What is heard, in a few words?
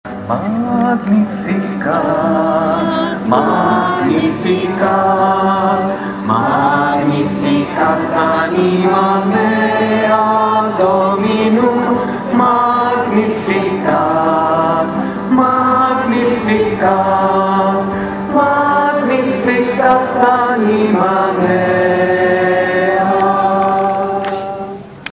magnificat